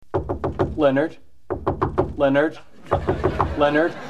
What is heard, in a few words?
Knock; TBBT; Sheldon; Leonard; Big Bang Theory